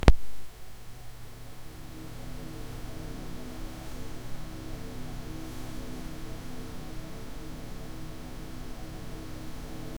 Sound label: pencil scratches soft